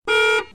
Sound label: tv buzzer; tv total